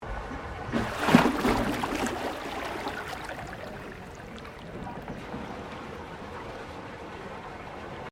Water Surge From Mine Pump, water output from old mine; Nature